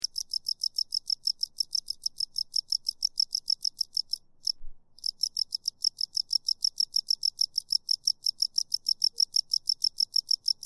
Single Cricket, A single cricket, cicada, playing away; Single Cricket Long: A single cricket, cicada, playing away. Stops occasionally, then continues.; Cricket Sound Effects